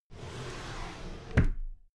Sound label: drawer cabinet paper close regular